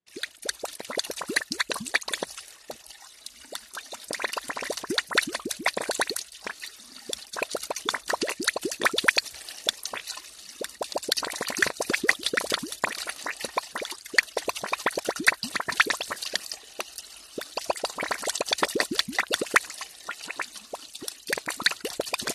WATER - SPLASHES & SPRAYS HOSE: INT: Hose blurping and blooping, gurgling liquid.